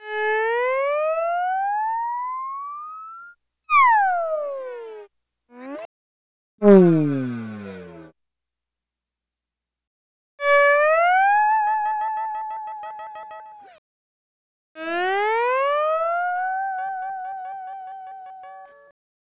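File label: Sunrise Guitar Fx G# Dry, Lounge; Zero-G Kocktail Kollection; Construction Kitz (Lounge); Sunrise Mixes 082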